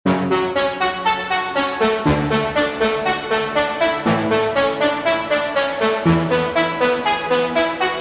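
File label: brass orchestral; serum preset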